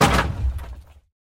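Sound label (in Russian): короткий деревянный звук разрушения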